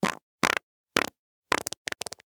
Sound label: fart short burst multiple